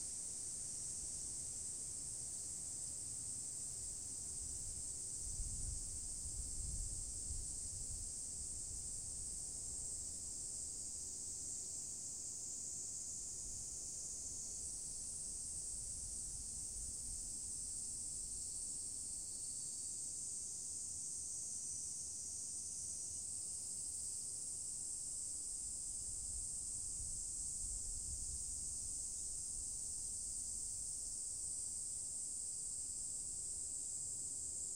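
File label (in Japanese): ひとりだけの公園, 環境音; 音声なし; きみと旅する自然の唄【ASMR環境音】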